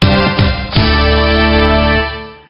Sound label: Jingle